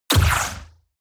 sci-fi puffing burst; schusswaffen

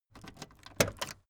car camaro unlock trunklid

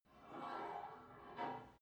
take off weight barbell reverby, fitnessstudio

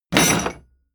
wood impact metal rattle hard

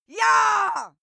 female cheer

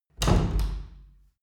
wood door old hard

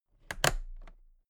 toolbox latches close soft; industrie wirtschaft; handwerk

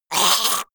monster choking high; comedy-cartoon; soundpool

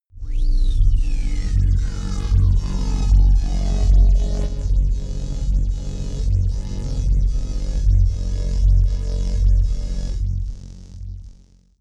drone bass bubble; musikelemente; drones

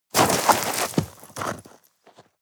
step gravel land

